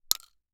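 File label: Weapon Bullet Shell 12 gauge Drop On Dirt; AdobeCC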